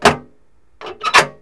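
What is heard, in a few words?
safe close; KABOOM! - Wild and Wacky Sound Software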